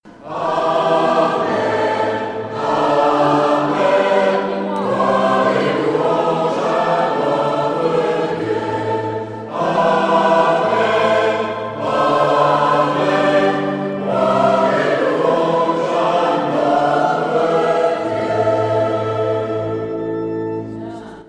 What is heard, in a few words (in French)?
Amen gloire et louange Tutti